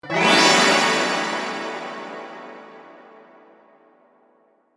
kirarin

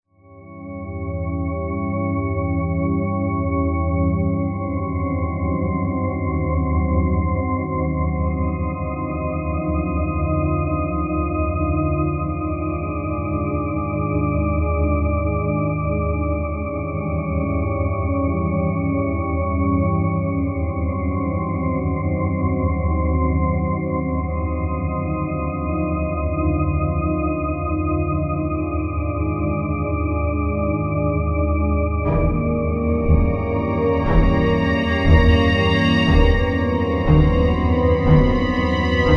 tension

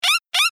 comical cute

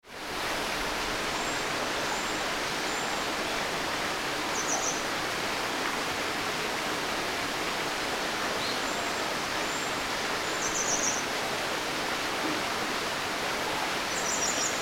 river bird

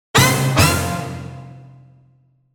jingle ochi

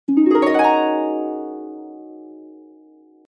harp

